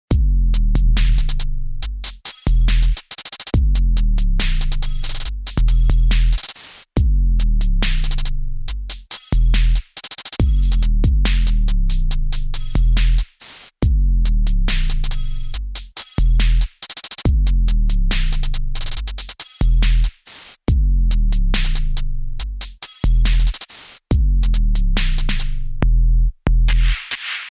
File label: magnetismus 2 Drumloop Kobald